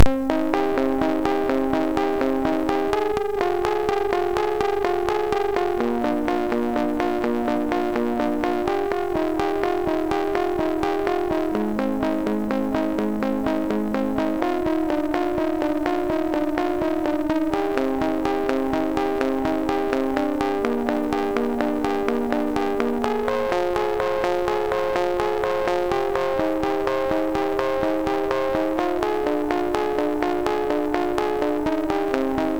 sid, MOS6581R4; Trallala

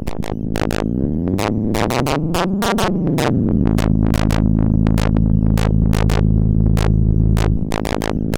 Robolift, MOS6581R4

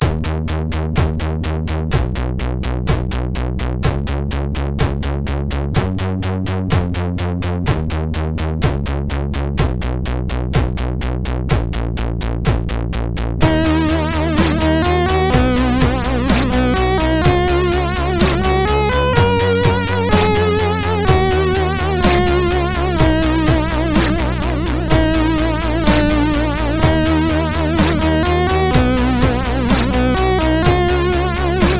Circular Letter tune, MOS6581R4

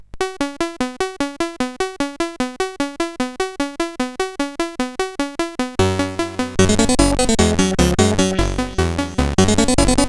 Fourteen Beat, CSG8580R5, SIDwave

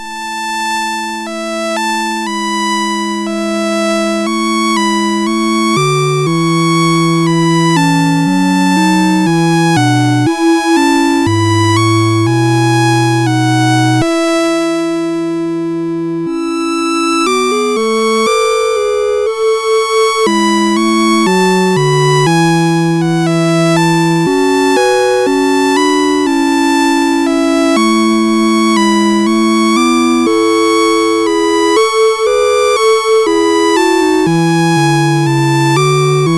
Christmas Music, MOS6581R4

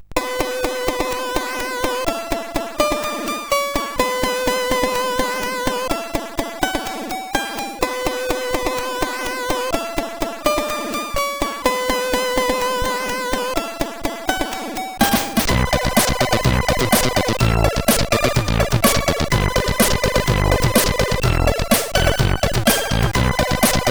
Intro Music, sid CSG8580R5

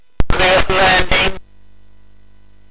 Jump Jet, sid MOS6581R4; hvsc

